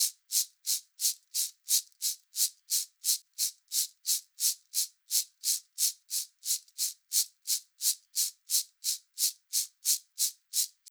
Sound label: choro dolente 88 afoxe